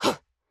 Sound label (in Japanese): 攻撃